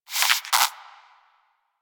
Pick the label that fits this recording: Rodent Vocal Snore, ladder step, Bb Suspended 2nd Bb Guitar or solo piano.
Rodent Vocal Snore